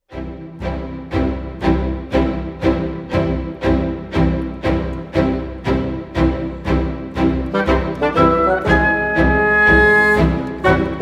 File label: Concertino for reed trio and strings Allegro Marciale